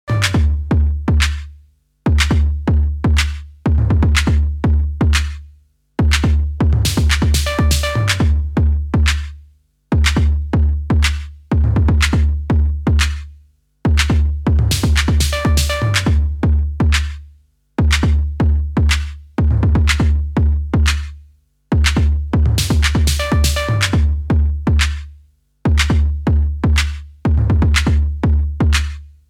Modularer Beat

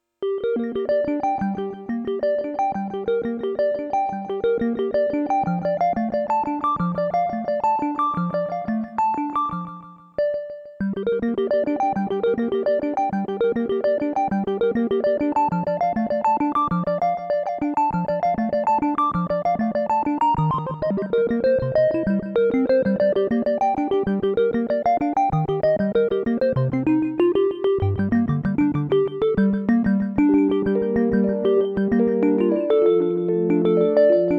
FM Delay mit Nord Pad

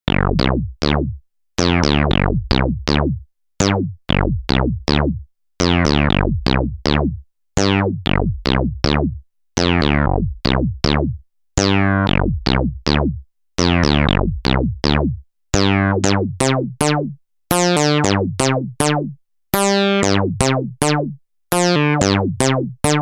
PulsBass mit Resonanz

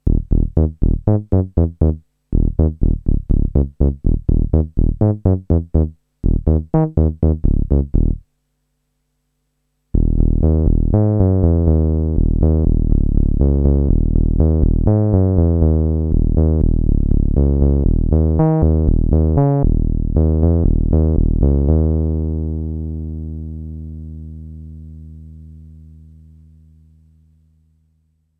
Teisco S100P ELECTRONIC BASS mit Sustain

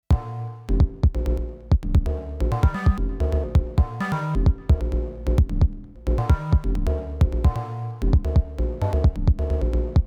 Melodie; Five12

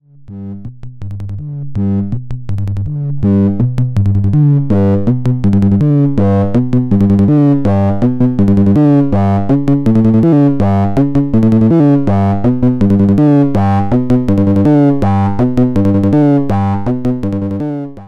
Pittsburgh KB 1 Sequenzer Pattern mit Modifiern

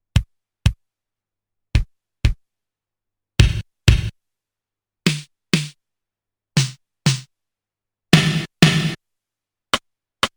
Korg DDD-5 Sounds Einzeln

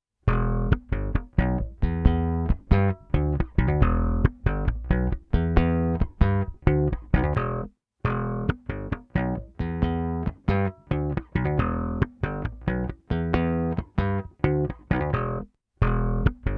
Rick trocken tube trockencomp tubecomp; Fafner

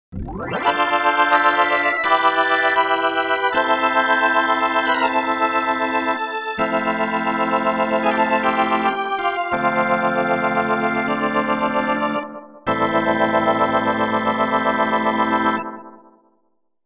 Yamaha CP88 Orgel

